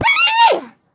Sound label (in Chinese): 熊猫死亡声